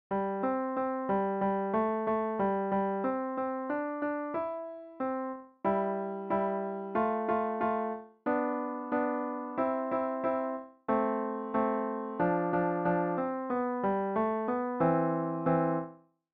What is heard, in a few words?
piano solo